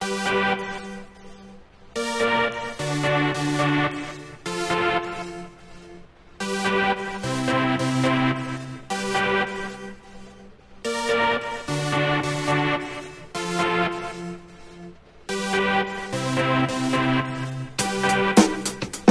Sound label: microKORG